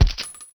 USP

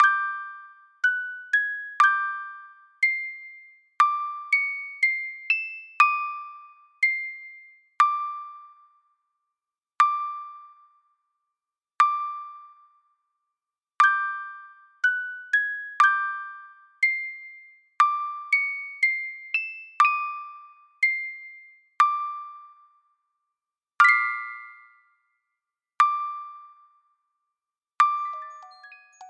Dirge, Music Box; animal crossing new leaf welcome aamiibo soundtrack

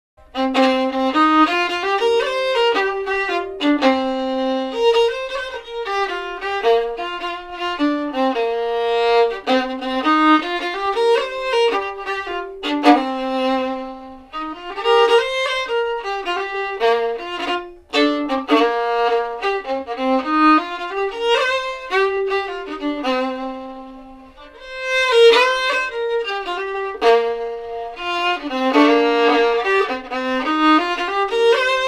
Violin; Assassin's Creed Syndicate

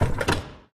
Caged Creature Dial Turn

